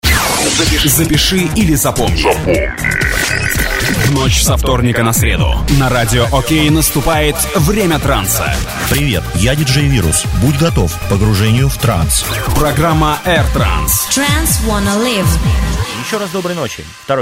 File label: Radioshow Trance